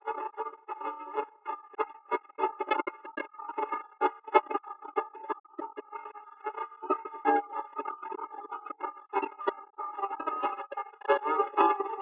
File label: Jazz